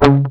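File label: GUI Pick Damp, guitar; Best Service Gigapack 2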